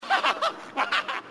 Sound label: evil grunt laugh; grunt crazy